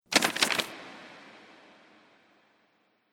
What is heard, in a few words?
Monastry Manuscript Paper; Paper Sound Effects